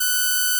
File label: Inharmonicity F#6